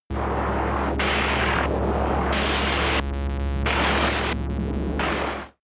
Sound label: scatter vent buzz synth low